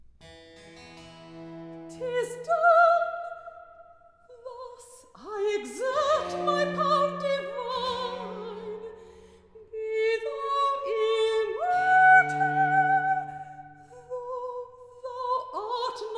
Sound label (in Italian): Recitativo; Tis done; Handel Masterworks